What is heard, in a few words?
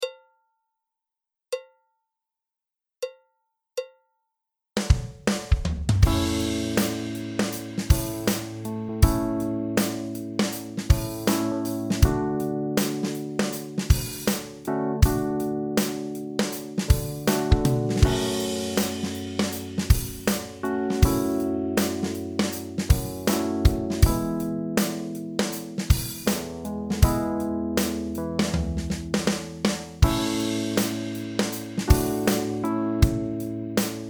Chord Key of A